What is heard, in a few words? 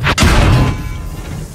beat